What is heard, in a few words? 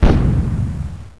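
explosion; THEXDER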